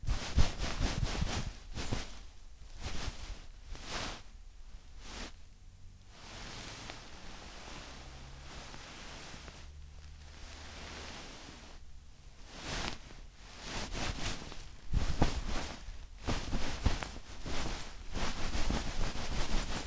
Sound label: Fabric Rustling